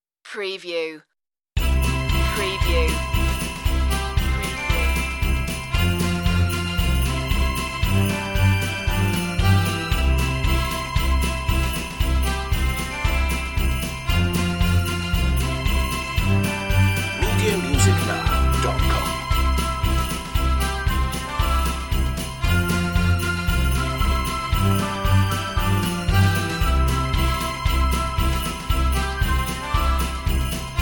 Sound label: Jingle Bells, on-hold music